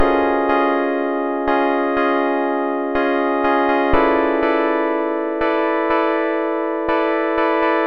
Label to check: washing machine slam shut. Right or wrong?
Wrong